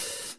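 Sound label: open neutral; Pro-Rec - Synthsonic; HIP HOP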